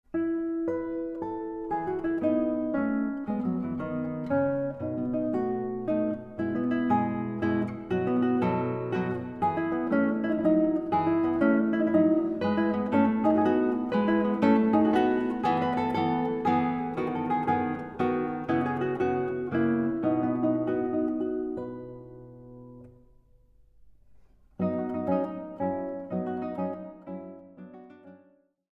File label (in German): Gitarren Duo